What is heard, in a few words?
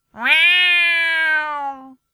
neko